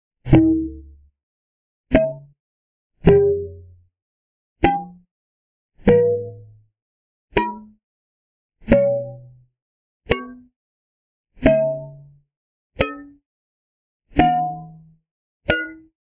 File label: Tin Can Ping Scale